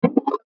Mouse Over; MULTIMEDIA; Blastwave

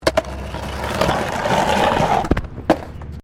Skateboard Grind; Skateboard sounds; Sports; Skateboarding

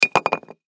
Ice Block Set Down Rattle; Ice (Sound Effects:Weather); Blastwave

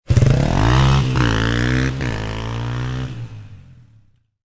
Motorcycle Dirt Bike Drive Away, Motorcycle motor; Motorbike type offroad Dirt Bike, motocross, drive away; Lynne Music

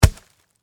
Log Drop Forest; Wood (Sound Effects:Materials)

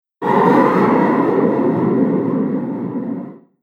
Spectral Tension Whoosh; Whoosh Sound Effect

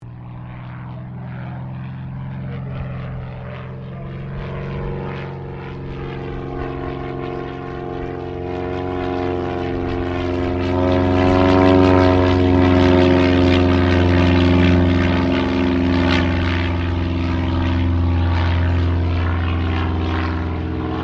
AIRCRAFT CT4A PASS, military trainer aircraft; Airplanes; Vehicles

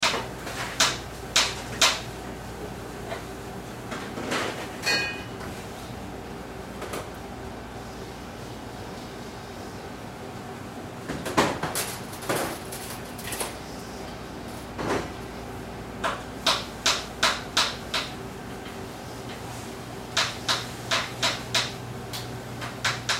Kitchen Cooking Cleaning Loop; Activity; Restaurant; Food Prep; INDUSTRY; Blastwave

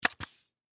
Industry Brad Nailer Electric Shoot Single; Power Tool Sound Effects; SFX Bible 6

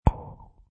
Cartoon Pop

